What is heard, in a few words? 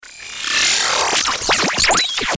Stinger Tight Chopper Frequency Sweep; Production Element Imaging Element Accent Transition